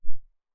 silencer mp9 mono very far, weapons

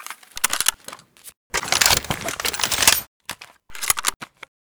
reload empty, sv98

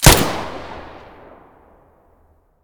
muzzle mono

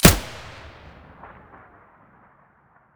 vihr muzzle stereo, weapons

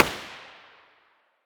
muzzle g36 mono far, weapons

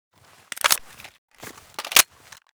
hpsa reload

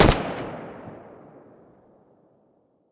silencer distant, weapons; vpo101

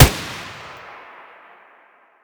sig550 muzzle distant; weapons

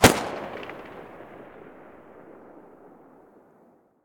usp45 muzzle mono far, weapons

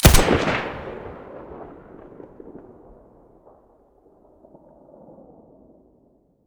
sa58 muzzle, weapons; STALKER; IWP

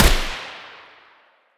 muzzle g36 mono distant

